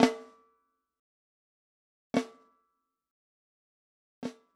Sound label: SnareDrum; 膜鳴楽器; 打奏; SnareDrum-DoubleStr